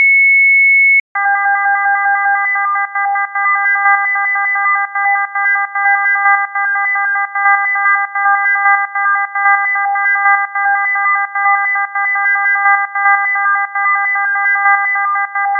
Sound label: MPDA; MPDA-4x10